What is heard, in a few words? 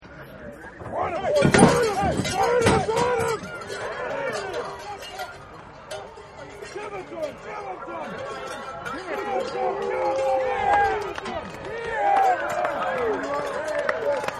Rodeo Starting Gate; Backgrounds; Rodeo Starting Gate 7